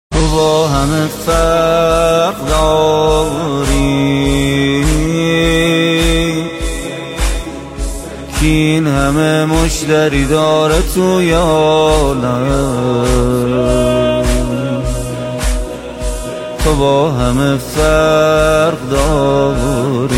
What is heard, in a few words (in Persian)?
نوحه کوتاه